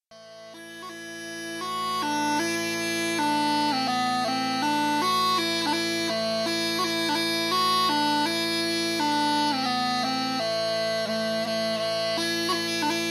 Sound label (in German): Smallpipe Mittelalter AEa